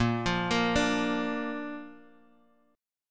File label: Bb Major, Guitar, Open F; 10,12,10,9,x,x; Bb arpeggiated; JGuitar